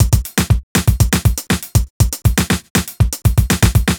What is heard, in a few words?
120 Drive beat basic, Nu Jazz House; 120 Beats; PowerFX